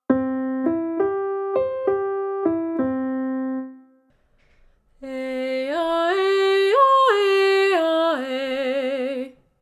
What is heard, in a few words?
Vocal Exercise